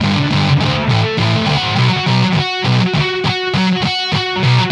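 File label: Distortion Riff Guitar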